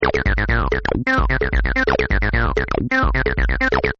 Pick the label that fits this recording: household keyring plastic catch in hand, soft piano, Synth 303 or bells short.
Synth 303